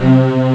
mega string; Amiga Soundtracker Sample Packs

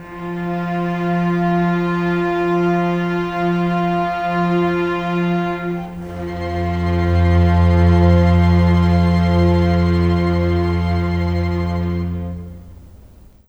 Cinematic Strings, Orchestral

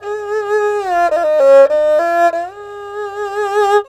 ERHU, CHINESE SET; Spectrasonics; Heart of Asia

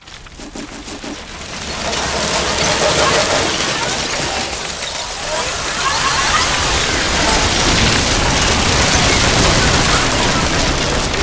GRIP, Disjointed Textures; USB Soundscan vol.33; AKAI; CHAOS

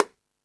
Latin Layers, Drum Machines E-MU Pro-Cussion

